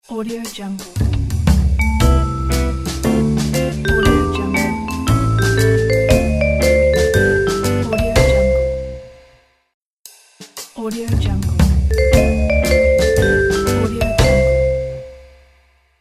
vibraphone logo

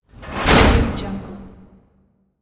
metal door slam

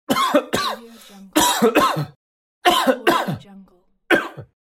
cough

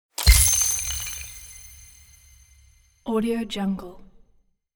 christmas magic positive ice break chime; gamehybrid

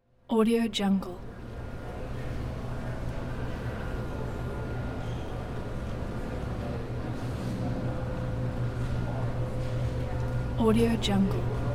quiet night street

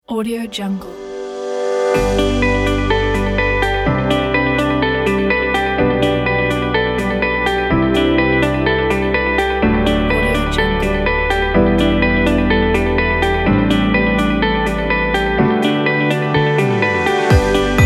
inspiring corporate motivation

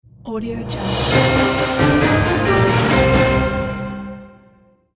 dramatic orchestral breaking news jingle; tony-parsons-music

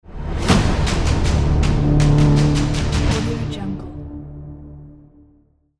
vikings epic musical sound

